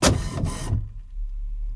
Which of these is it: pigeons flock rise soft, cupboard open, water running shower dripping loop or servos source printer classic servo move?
servos source printer classic servo move